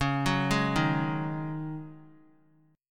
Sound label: C sharp Suspended 2nd Suspended 4th, C sharp; arpeggiated; JGuitar